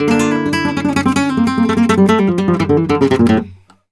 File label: Flamenco Guitar Picado, a; Best Service ProSamples vol.46 - Flamenco